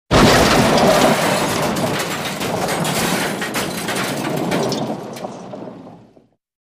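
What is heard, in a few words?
Crash Car Flip Impact Roll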